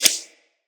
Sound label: UI Login Reward Cycle